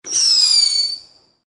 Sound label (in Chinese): 音效 yanhua